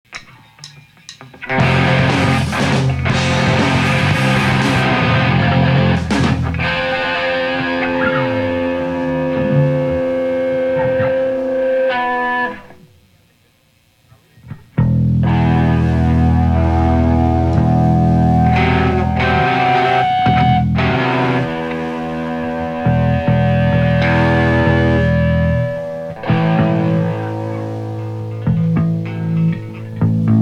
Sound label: tuning